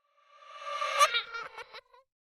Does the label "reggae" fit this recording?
No